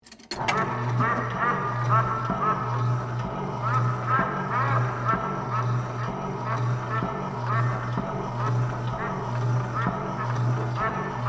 Arcade Duck Ride, Technology, Arcade, Duck, Ride, On, Run, Off; SoundEffects-BlastWave